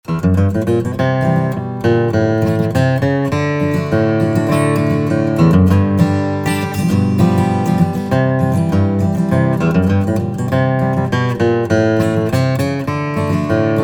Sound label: martin hd 35 sunburst